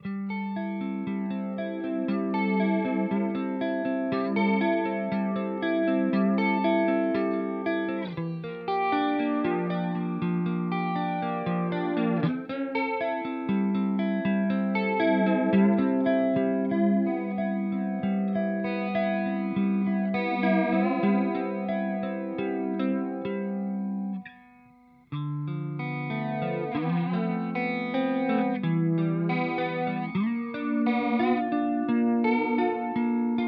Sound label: Walrus Audio Polychrome Vibrato